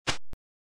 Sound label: footstep